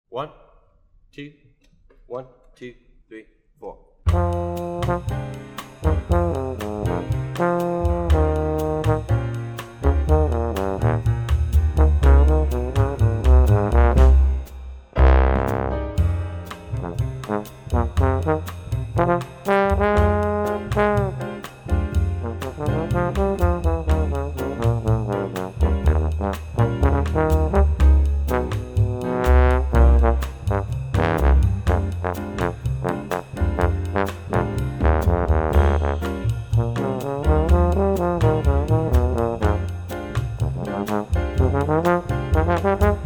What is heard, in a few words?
latin bass trombone